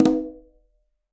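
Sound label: Conga B Flam Open; Latin Percussion; Drums; Drum Hits; Ableton; Factory Packs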